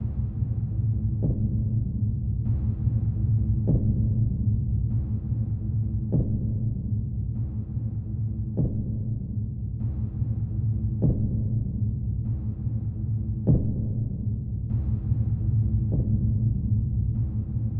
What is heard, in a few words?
Room Pulse Horror Soundscape; dark ambient music